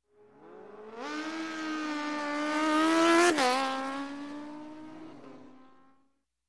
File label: Motorcycle, Race, Drag Single Bike Race By; Sound Ideas; Series 2000